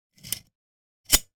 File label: stapler loading mechanism pull back and release; office